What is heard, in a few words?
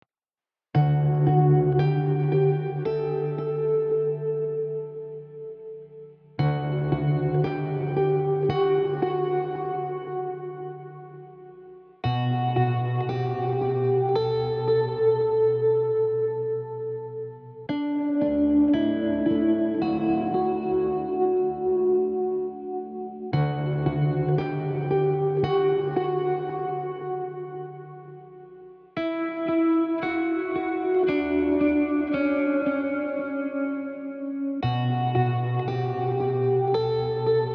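Street Guitar Electric Atmospheric, 85 bpm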